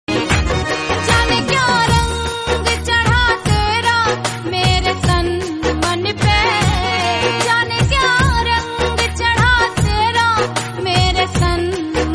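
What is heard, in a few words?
Hindi bhakti song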